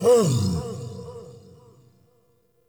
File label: Cannonball Slam Vocal; Powers; Bromius